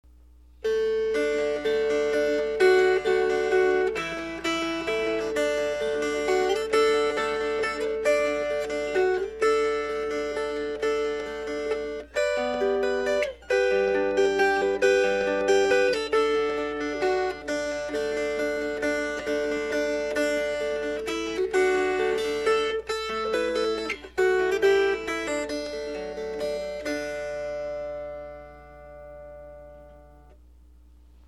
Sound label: Fingerpicked; Lord Lovel